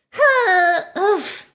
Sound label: dying; daisy; sm64coopdx; char-select-extra-chars